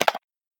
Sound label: grab Sound; BingoCard